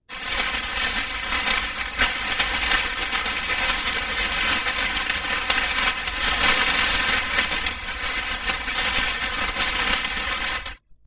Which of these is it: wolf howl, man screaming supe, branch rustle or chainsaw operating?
branch rustle